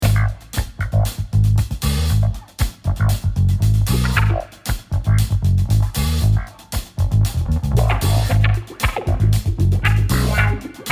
Level start; Deadbeat Heroes

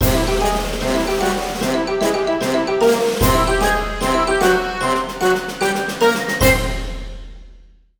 escape intro; Ringling Bros. and Barnum & Bailey; Wii

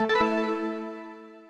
Energy recover

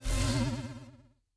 Portals open

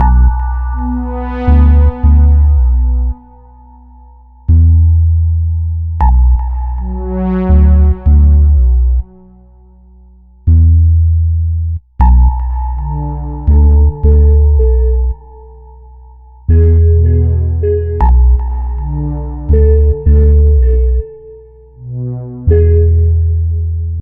stem outro; Pix the Cat

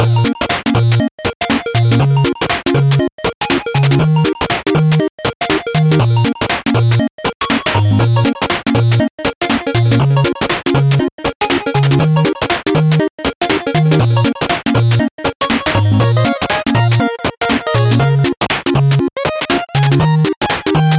Main Bgm; Dodge Hard

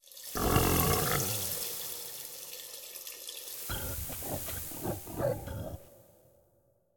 Pig piss; super mombo quest

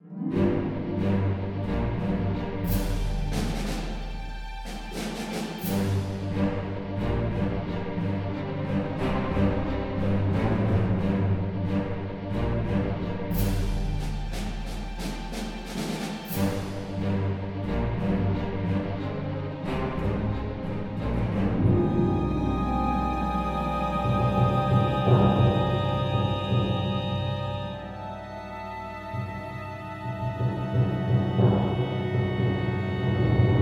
Galatic Starfighter Music; Star Wars The Old Republic Rise of the Hutt Cartels and Shadow of Revan

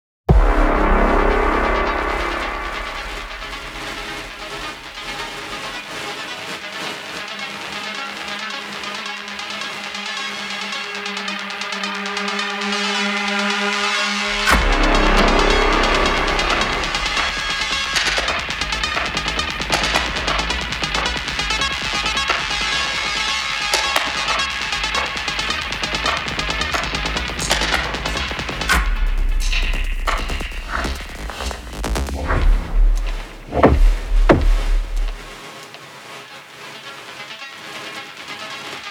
Combat Loop 135bpm; Combat2v2

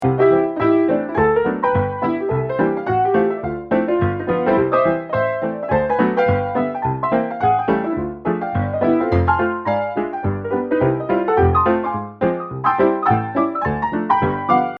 Indoors Ragtime Tutti; westerado double barreled

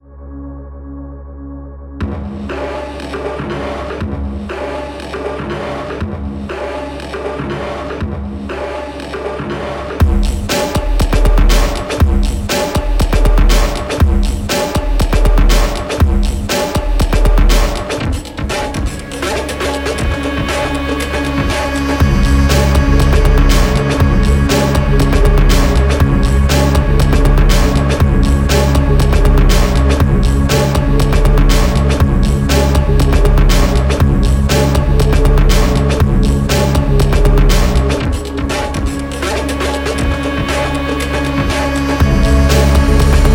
Music Action; Instinct

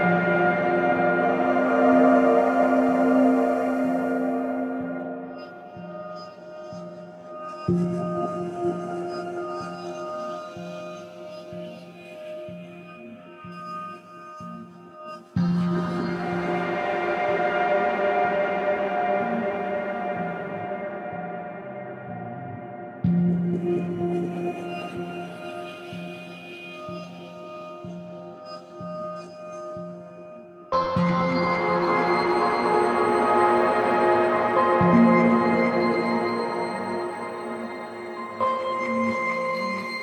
Music Canal Amb

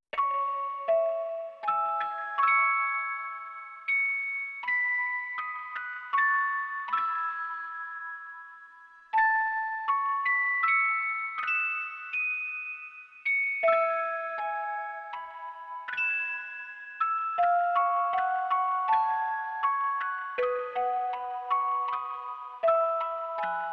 Musicbox Lofi; Bravely Default

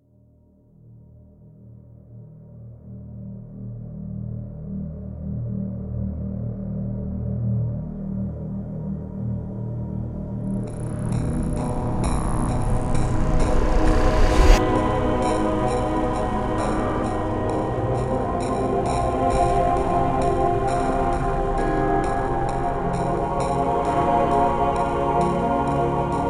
ambient bed; AirMech Command VR

